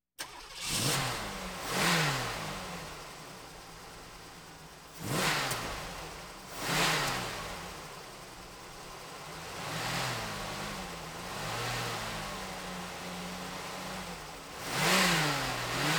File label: Automobiles various